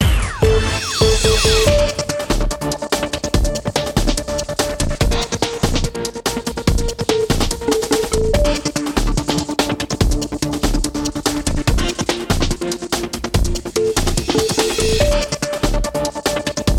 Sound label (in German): Jingle; Mannheimer Morgen; corporate sound